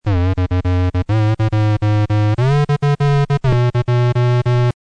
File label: TONES SCRATCHING